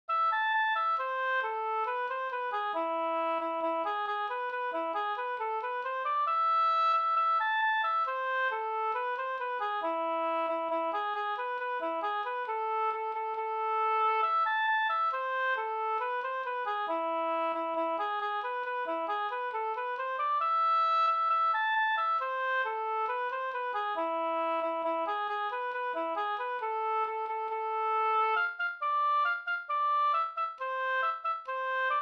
schottis jenkka; Talgoxen Titityy fi

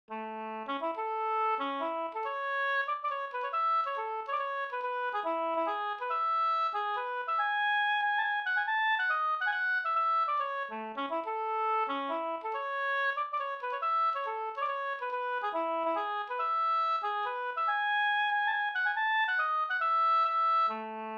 hambopolkett; e August Holtz Bjornlunda Sd

